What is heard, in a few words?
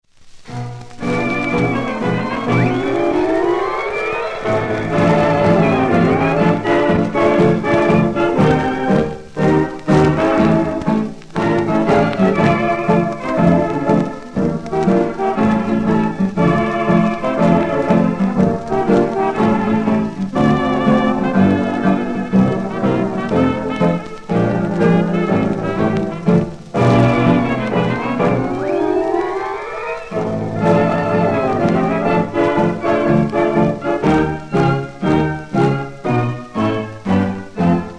British Dance